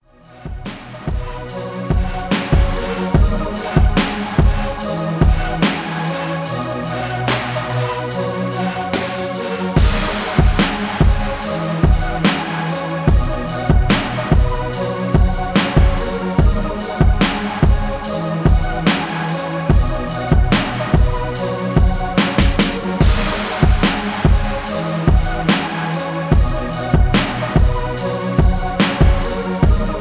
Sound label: 72 BPM